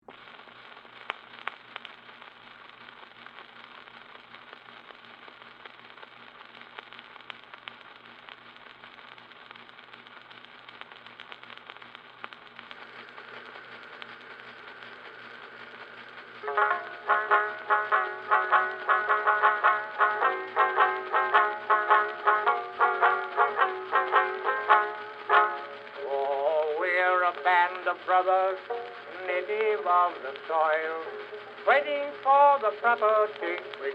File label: Phonograph Song, Wax, Cylinder; Technology; Miscellaneous Electronic Sound Effects